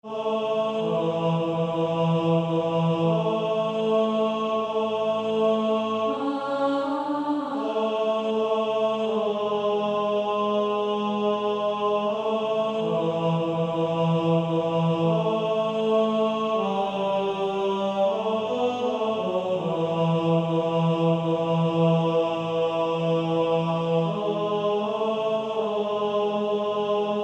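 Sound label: Male Choir, tenor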